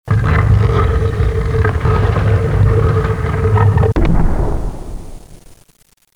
Passage secret; bruitage

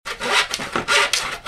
Scie; bruitage; Outillages

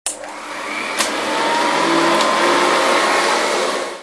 Aspirateur, bruitage electro-menager

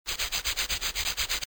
Brosse à dent; bruitage

